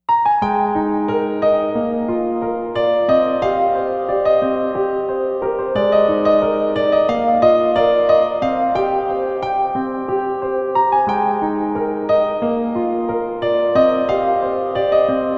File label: Piano Version; Harumoi; Harumoi Complete Sound Track